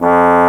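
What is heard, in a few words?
Bass Trombone mf F1; Digital Sound Factory Virtuoso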